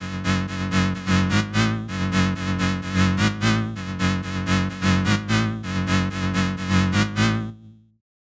LEAD

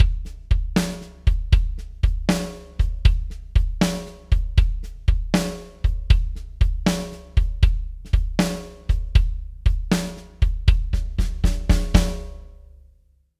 manley core drums dry

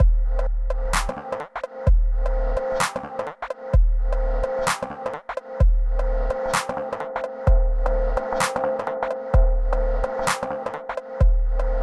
korg volca sample

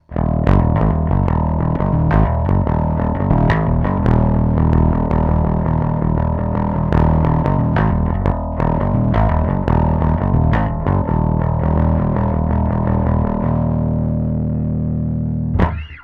fuzz drive blend; Palmer Pocket Bass Amp; drive12

